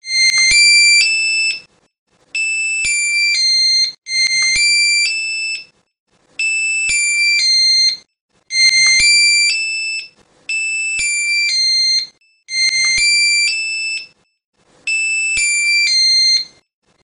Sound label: alarm wakeup ringtone